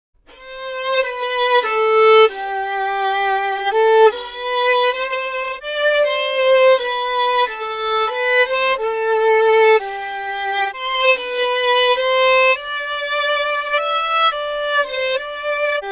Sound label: Bach Chorale SF Chroma resyn